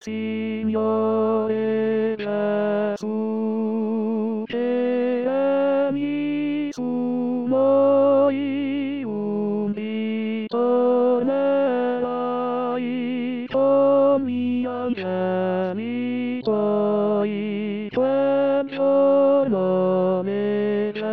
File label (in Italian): Tenori; Signore Gesu